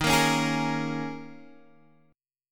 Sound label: Bb Minor 7th, Eb; strummed; chord shape